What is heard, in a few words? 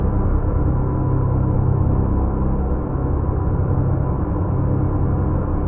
dark pad seamless loop